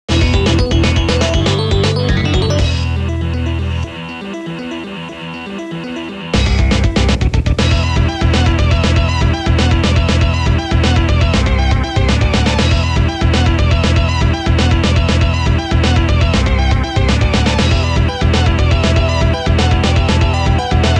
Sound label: Retro; Dragon Ball FighterZ